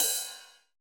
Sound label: cymbal, Roland MC-909